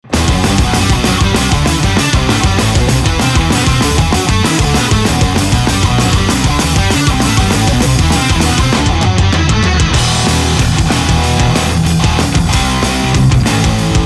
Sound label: studio, gtar